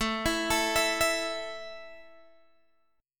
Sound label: A 5th A down Guitar Open G